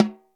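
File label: snare Tom, EMU Orbit-3 drum machine; 300 Drum Machines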